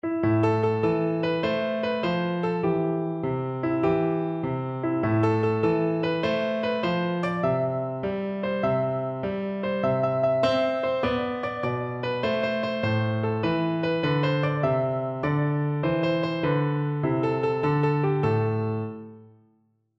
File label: when johnny piano